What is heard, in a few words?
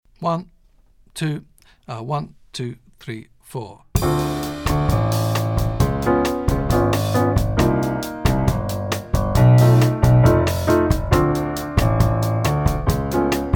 Bossa Bass Line with chords in right hand; teclado; jazz iniciante